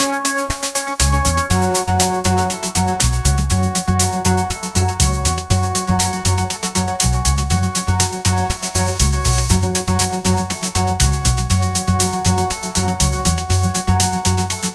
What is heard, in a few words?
sunshine pop anthem, ringtones; ai